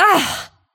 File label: Grunt; Lewd SFX